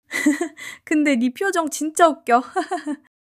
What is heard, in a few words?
laughter